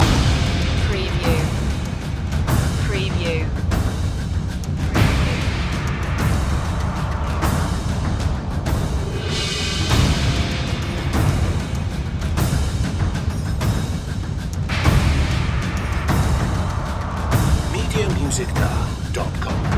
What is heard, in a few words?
percussion loop